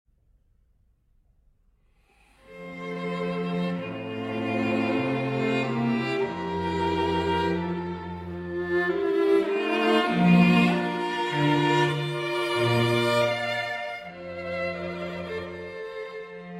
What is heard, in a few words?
String Quartet in C Major, Andante; Mozart String Quartet No. 19 in C Major, Op. 10 No. 6, K. 465 Dissonance; II. Andante cantabile